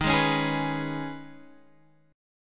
D sharp 7th Suspended 4th Sharp 5th, Guitar, Half Step Down